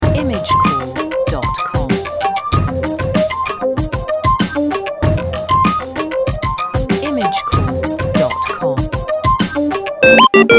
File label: stock audio beat